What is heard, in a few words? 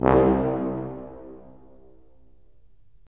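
brass tuba; LA Composer vol. 3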